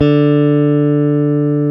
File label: GTR, Dual Guitar; Club-50 - Foundations; Paul